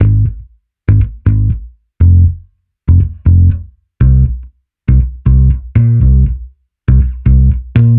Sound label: Bass Guitar 120BPM Cm; 99Sounds x Monosounds; Instrument Loops